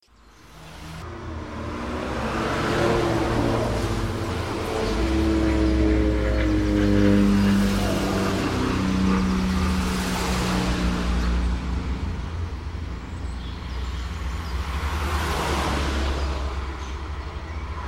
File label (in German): Hintergrundbeschallung; Modellbahnen; Altstadt; Anlage 2